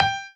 piano melo

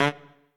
Trumpet melo

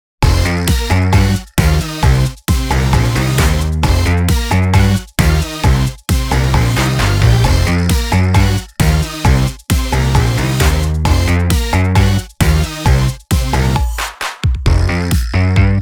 off vocal +1

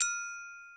gloken

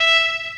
Piano E6; 轻音少女

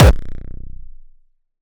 Kick hard; otto入站; 电棍

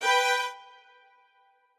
strings

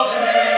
choir

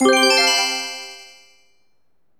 SE GAME BREAK; maimai

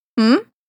Hmm？; Marisa

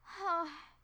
Huff; Alice

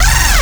bass

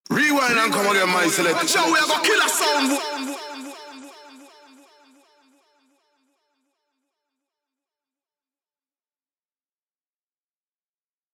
vox

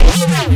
custom bass

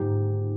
harp

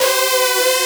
custom synth

